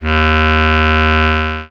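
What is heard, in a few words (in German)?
d#1, Bass Klarinette, MIRAGE; Best Service ProSamples vol.55; Retro Sampler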